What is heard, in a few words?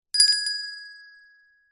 bell small hand ring short